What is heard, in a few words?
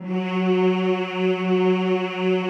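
choir F#2, Mmhh; Sound & Vision; Gigapack I CD 2; CHO Mmhh + Rrrr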